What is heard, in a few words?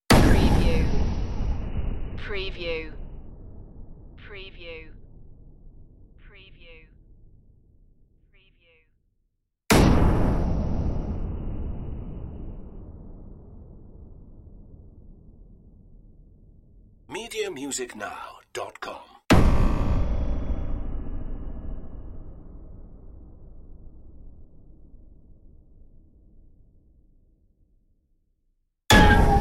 dark impacts